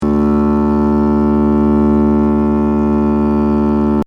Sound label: D bass; tuneguide